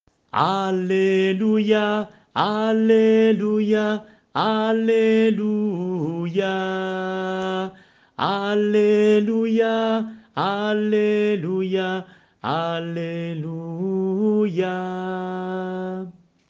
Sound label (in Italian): Alleluia Salmo